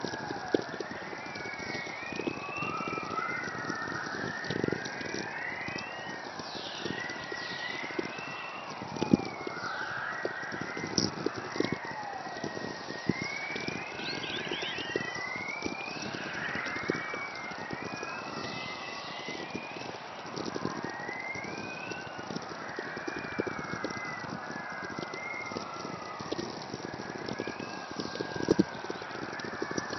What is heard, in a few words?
chorus whistlers; Halley